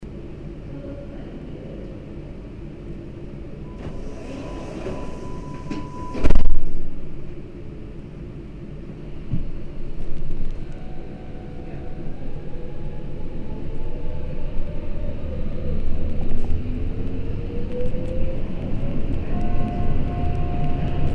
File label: subway departure inside